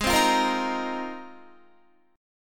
Ab 6th Add 9th, Guitar; strummed

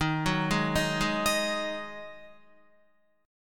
D sharp Minor Double Flat 5th, Guitar; D#mbb5 arpeggiated

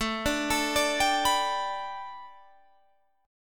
A 7th Suspended 2nd Suspended 4th; A7sus2sus4; arpeggiated

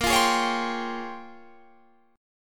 Bb Minor 13th chord; strummed; JGuitar

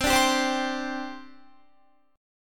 C Suspended 2nd, C, down, Guitar; Csus2; strummed

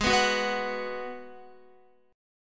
G sharp Minor 7th G sharp, Guitar; G#m7 strummed; JGuitar

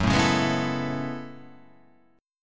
F sharp Minor 11th, guitar; chordshape; down

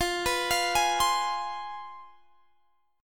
C Major 7th Suspended 4th Sharp 5th, guitar

